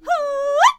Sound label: Radiant Glaive Attack Vocals